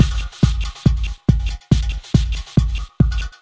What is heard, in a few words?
140 kinda chuny four on floor, drum loops and breaks